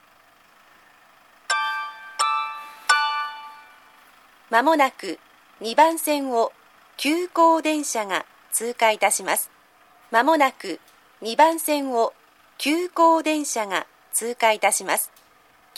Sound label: pass express, station; oyamadai; tokyu-corporation